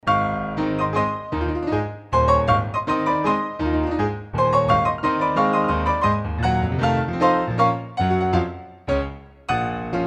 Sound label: piano, Alesis qsr synth; chopin